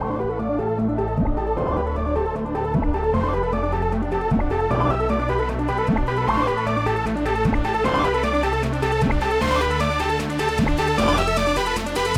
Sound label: Arcade Theme; Pitch Black Audio Pong